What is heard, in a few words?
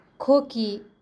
cough; k vayo